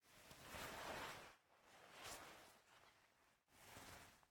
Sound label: Player Wakeup Fall; MiSide